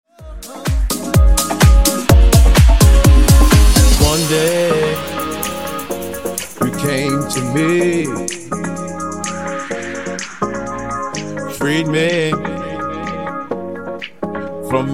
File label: Funk Nu Disko 126 Bpm